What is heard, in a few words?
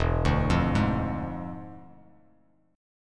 E 7th Flat 9th, Bass Standard, E7b9 chord; arpeggiated